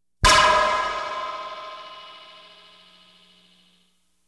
Science Fiction effects, Lasers, Light beams

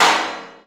Menu Select

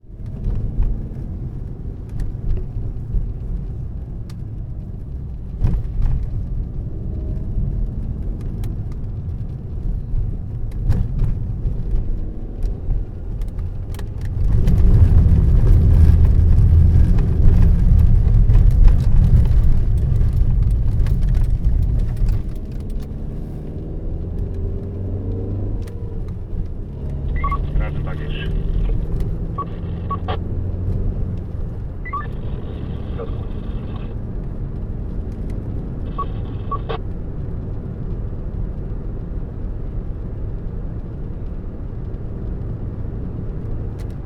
driving in a cab; vehicles; NS Studios - ultra sound collection

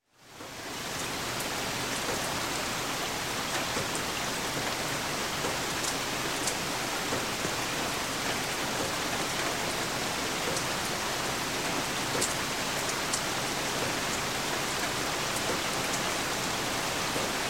Rain, Medium On Lake, Water Dripping On Rock; Sound Ideas 2000 Series

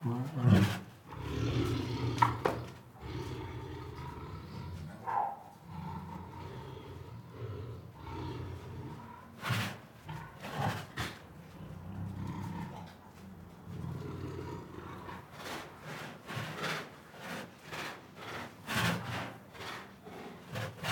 Siberian Tiger Make Many Sniffs and Snorts; Tigers; Sound Ideas

